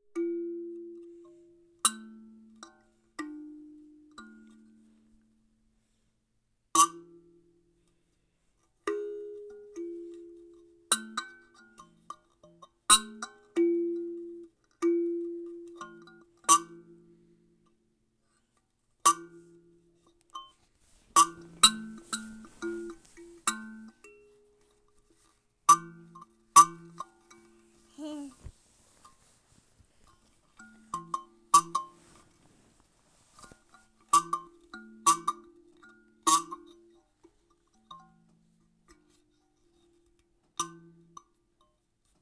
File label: playing a thumb piano